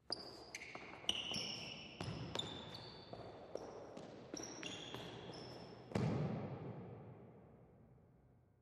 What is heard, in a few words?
Basketball, Basketball Practice Gym Single Person; Basketball Practice Gym Single Person Run Scuff Floor; SoundEffects-Blastwave; Sports